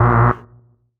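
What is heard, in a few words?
Rave Synth, Dance Mega Jungle Rave; Best Service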